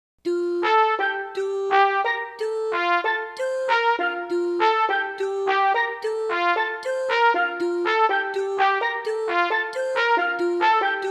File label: Grouping by Timbral Similarity